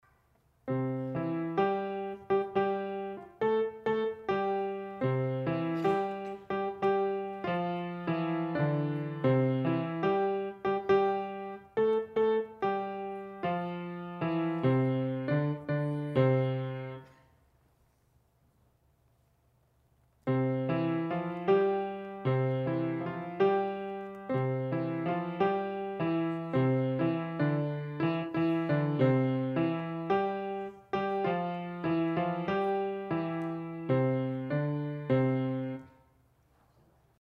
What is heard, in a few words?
Instrumental